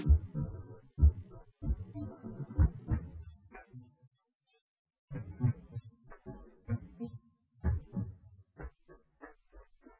wdrums inst sim; SiSEC08; SiSEC underdetermined

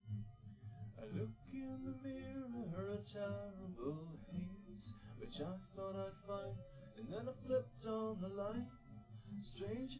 no drums live rec 1m sim; SASSEC07

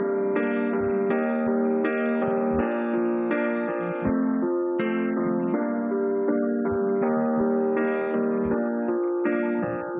nodrums inst sim; SiSEC10; underdetermined

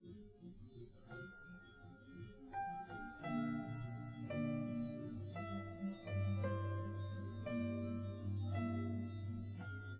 nodrums, live rec

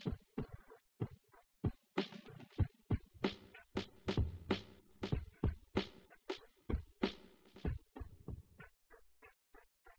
wdrums inst sim; SASSEC07

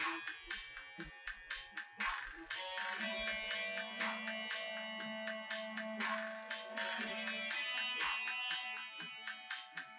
wdrums synthconv

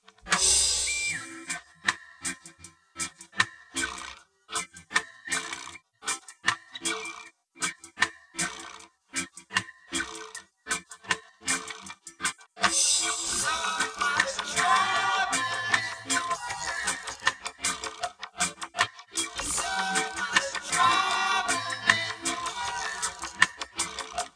percussion

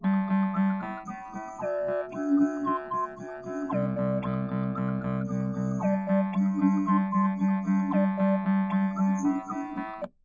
nodrums; SiSEC10; underdetermined

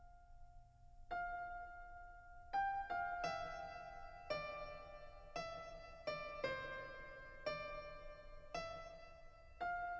nodrums live rec 250ms 5cm

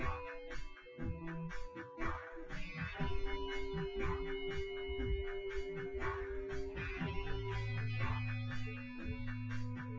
w drums synth conv 250ms 5cm sim

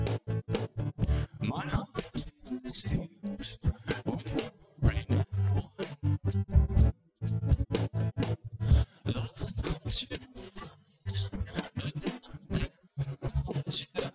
drums; SiSEC11

